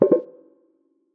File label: Menu Select; Martian Chess Audio